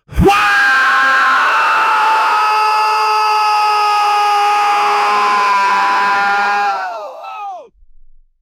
intense male, warrior; 10 seconds

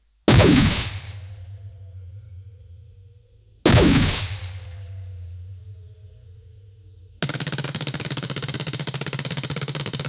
large minigun spinning an

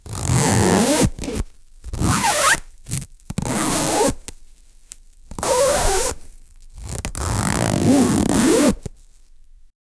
gritty rope sliding hard, friction

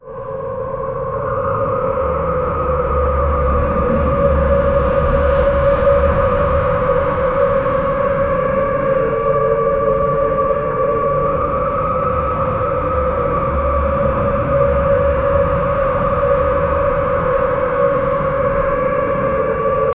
scary industrial post apocalyptic

